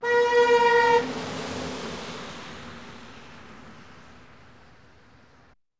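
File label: sounds of cars, traffic jams and car traffic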